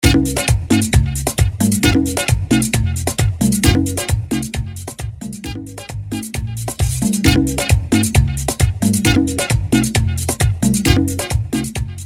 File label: intro outro 133 BPM